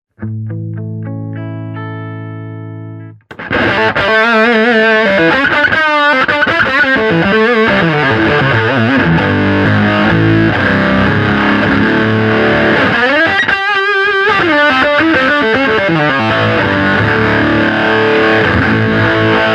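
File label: sustainer with booster lo, pedals; tone bully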